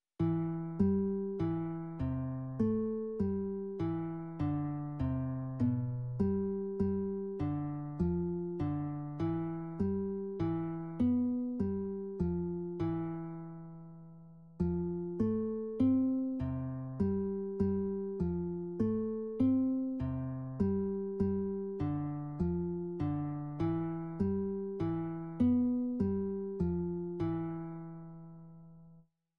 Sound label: accomp; beginner classical guitar lessons